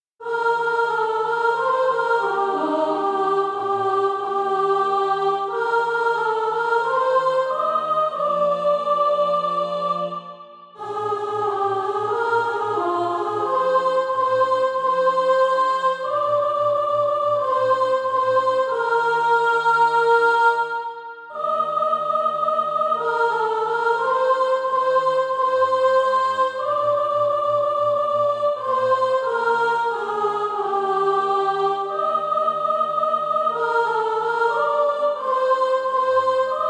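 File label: soprano, SATB Practise Music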